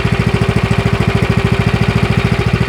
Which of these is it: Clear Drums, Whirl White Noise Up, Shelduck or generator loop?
generator loop